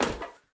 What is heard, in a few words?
walk proflist; Escape from Tarkov